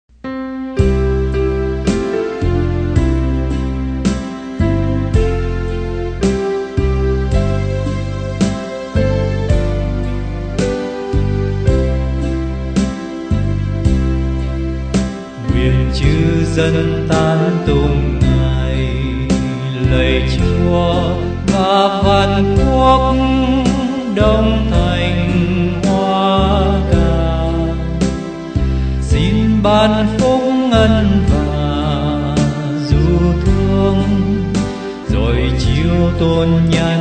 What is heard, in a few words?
BASS